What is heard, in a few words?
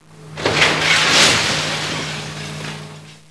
demolish; cidade; derrapadas